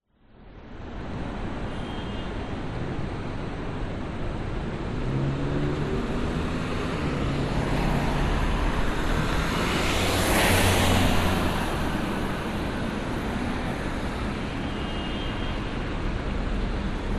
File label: public places city busy street traffic pedestrians brisbane australia